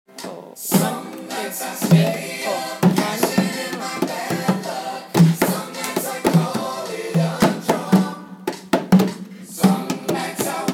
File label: rhythm